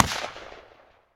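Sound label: pistol fire distant; Zomboid 41.78.16; Project Zomboid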